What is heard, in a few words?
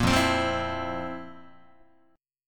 F Major 7th Suspended 4th F, Guitar